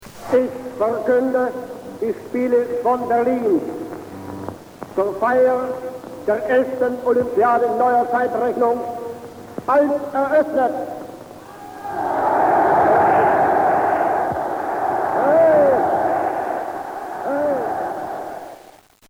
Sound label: hit